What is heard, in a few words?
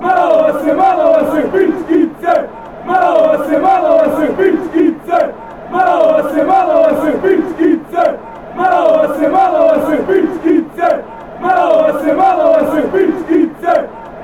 montenegro chant